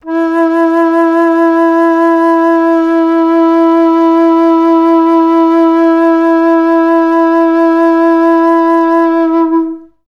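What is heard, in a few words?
alto flutes sustain E3; Best Service; Peter Siedlaczek's Advanced Orchestra; AFS LONG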